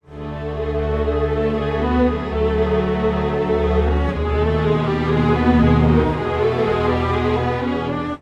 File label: strings; timestretch; bias peak